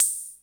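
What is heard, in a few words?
CR78 HH OP, open hi hat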